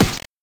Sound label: e imp take damage 8bit